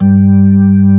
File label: HAM G2 -L, KEYBORD